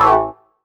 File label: chord A; Techno Trance Essentials; CHORDS